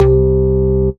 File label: Bass C, Offbeat Bass